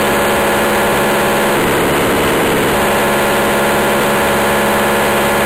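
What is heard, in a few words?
TETRA, radio sounds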